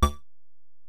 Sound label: Bell, Alesis DM-PRO